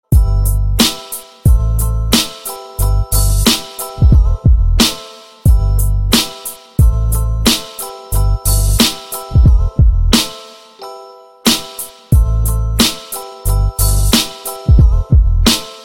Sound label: hip hop instrumental